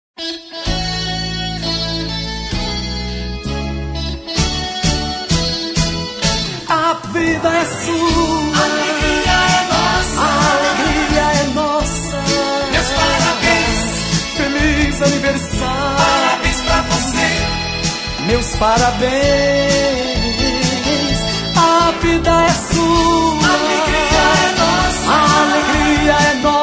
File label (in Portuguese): Feliz Aniversario